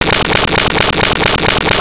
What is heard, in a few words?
Arcade Movement; 1001 Sound Effects